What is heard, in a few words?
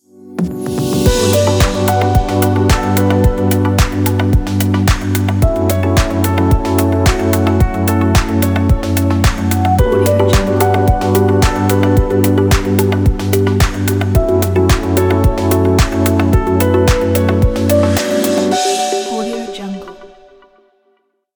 fashion piano ident